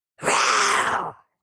Demon beast imp monster attack shout

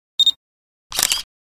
Camera shutter click autofocus with flash

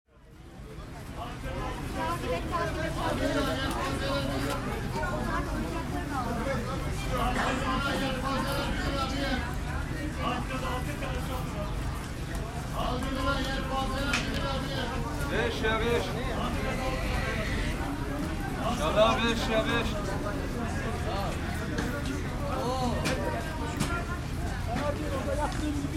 Market ambience bazaar vendors and people talking

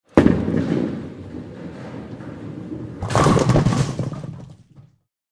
Bowling ball striking pins

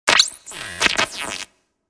Distorted glitch quick burst

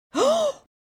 Witch fantasy vocalization sigh surprised

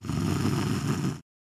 Female snoring

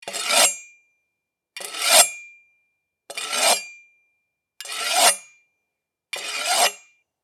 Sword sharpening metal scraping

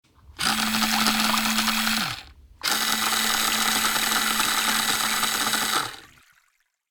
Toy boat in water remote controlled; rc toys sound effects

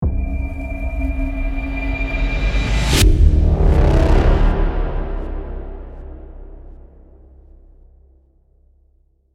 Cinematic braam hit impact; transition

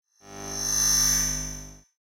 Holographic scan digital interface

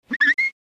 Glass window cleaning

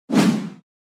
Long whoosh transition

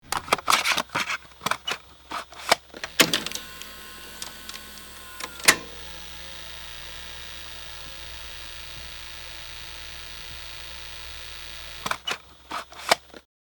VHS video cassette tape play starting up

